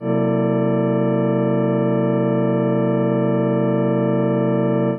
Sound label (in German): prometheus a1 hoch